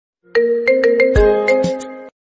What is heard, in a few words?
message notification love